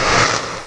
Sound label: extinguish; Odyssey Demo